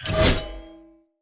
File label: Cleaver Heavy Whoosh R to L, Swords; Weapons; Player; Bromius Cleaver